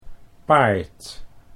paard

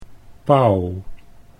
pauw

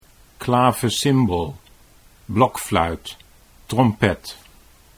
klavecimbel blokfluit

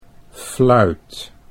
fluit

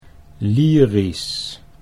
lyrisch

